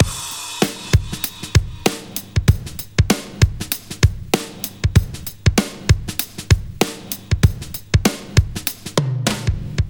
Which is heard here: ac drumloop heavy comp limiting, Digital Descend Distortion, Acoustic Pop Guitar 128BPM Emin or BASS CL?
ac drumloop heavy comp limiting